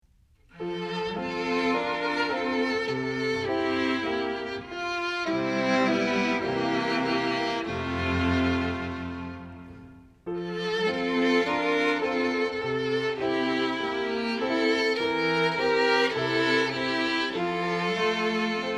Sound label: music only